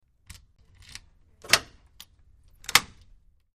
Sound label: Lock And Unlock Bolt In Glass And Wood Door With Key, X3; latch, metal